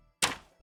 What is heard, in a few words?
Picking Up Wood Item; Daz3D